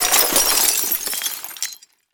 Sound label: glass debris